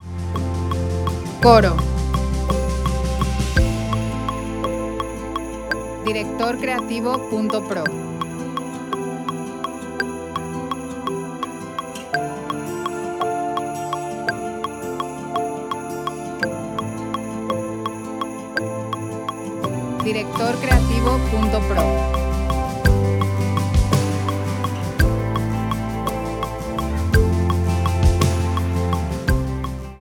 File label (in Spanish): Santa La Noche, 84bpm, 6/8, C